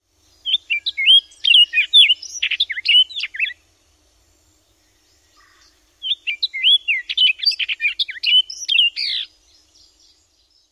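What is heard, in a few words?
orchard oriole song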